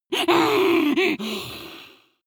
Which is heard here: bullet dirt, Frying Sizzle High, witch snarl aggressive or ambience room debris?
witch snarl aggressive